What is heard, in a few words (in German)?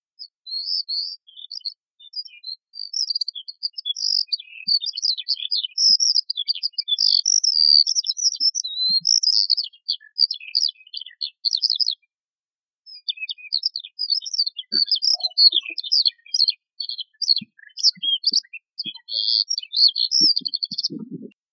Feldlerche